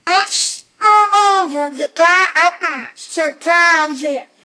jazz reveal sound

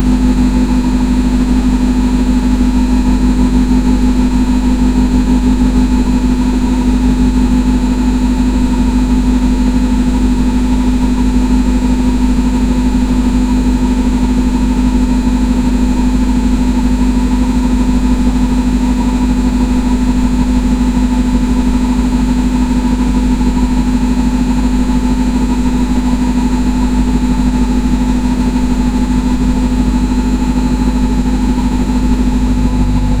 realistic sound of a germ, locomotive

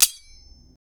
swords deflecting

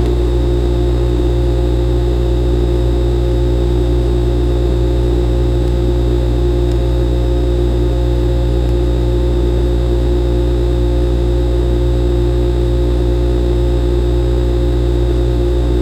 refrigeratlr starting up, appliance

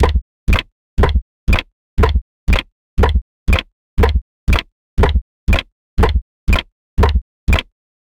cartoon camera click

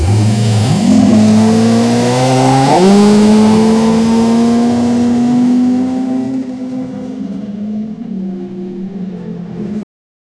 low acceleration formula, race car

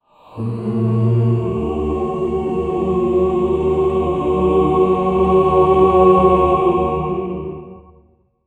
deep sad human choir suff

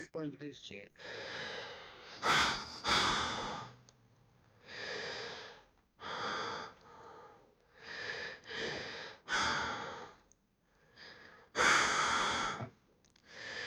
years old man holding, mouth closed

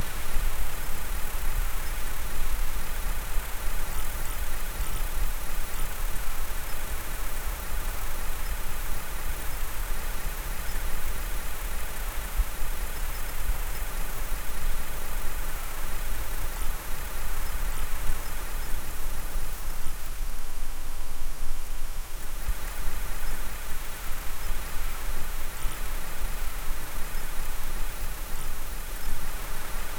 a mountain range, winter

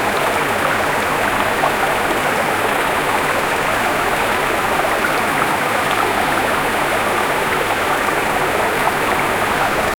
calm veena flowing river

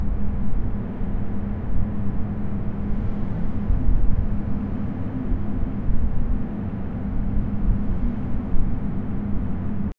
wind whoosh faint airship

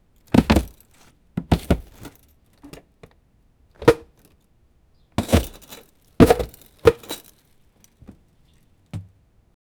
pick up tomatoes from the, basket

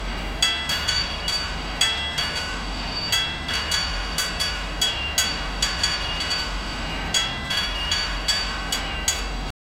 loud rhythmic hammering, metalworking

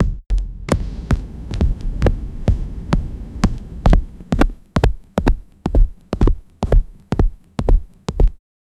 footstep of all type material

